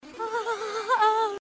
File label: swoon